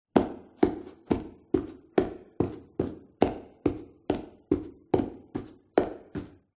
foley footsteps boots reverberant empty internal space